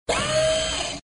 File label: Close Gate